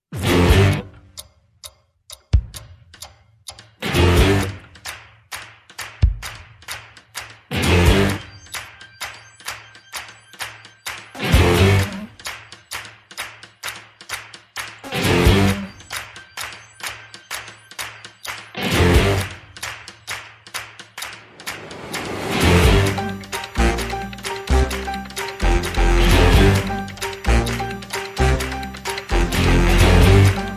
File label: No Lead Vocals